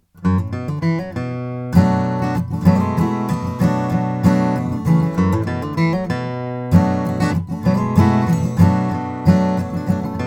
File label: martin om 28j custom indian rosewood